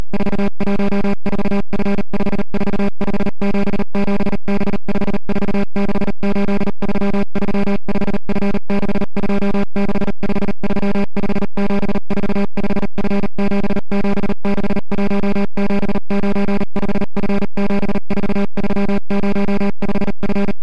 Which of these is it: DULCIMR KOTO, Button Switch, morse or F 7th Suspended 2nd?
morse